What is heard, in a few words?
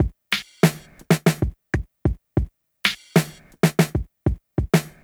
Street Tip, R&B Drums